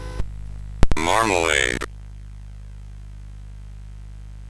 sid CSG8580R5

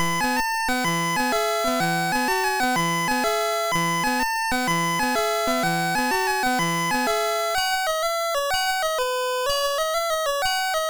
Buzz Off, MOS6581R3

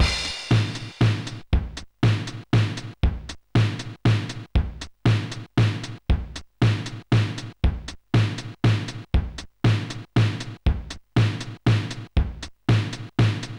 Waltz, Sonic Drum Kit

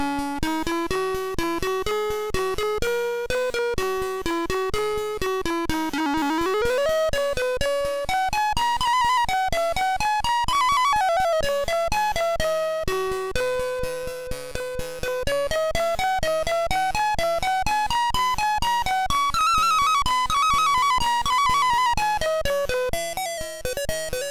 D-Minor Sonett; Cubehead

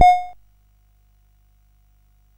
sid CSG8580R5; Magic Serpent

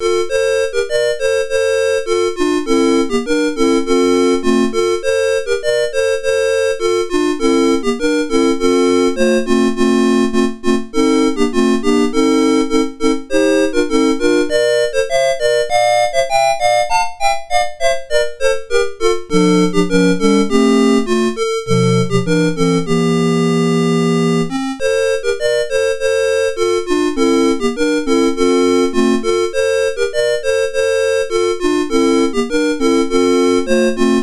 Digi Hits, MOS6581R4

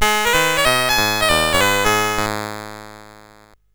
sid CSG8580R5; Metro-Cross

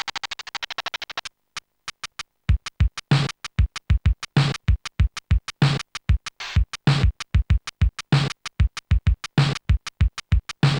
arkanoid rhythm; Sonix Music Driver

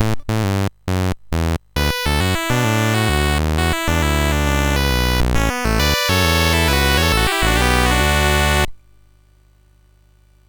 Number Bowling, MOS6581R4; GAMES

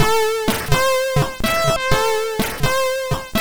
Jingles, sid MOS6581R4

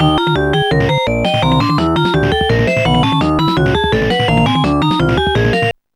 jazz three; Instant Music

